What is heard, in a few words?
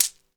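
rattle, percussion; ILIO; Synclavier Percussion 2; World & Orchestral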